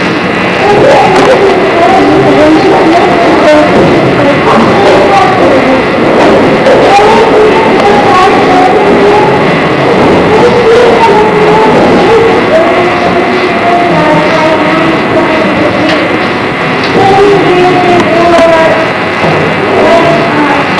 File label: child singing